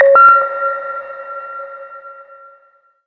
SHORT, SHORTNOIS

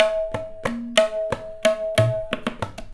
TABLA, Indian Traditions; USB Soundscan vol.45 - Indian Traditions; AKAI

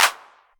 Clap, Novation Drumstation; DrumstationV1

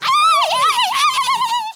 FEMALE RAVE; Spectrasonics; Heart of Africa

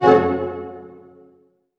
harp hit C MIN; Sound On Sound; Peter Siedleczek's Orchestra

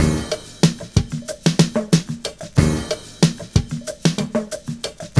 FAT STAB, Kitch 70s; Univers Sons - Kitch 70s

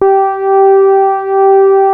Prophet 5 G5, Synthesizer; Hollywood Edge - Giorgio Moroder Rare Synthesizer Collection

